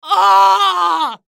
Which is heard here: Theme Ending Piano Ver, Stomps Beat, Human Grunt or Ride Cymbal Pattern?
Human Grunt